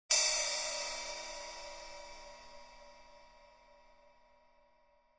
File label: Cymbal Accent Hit, drums cymbal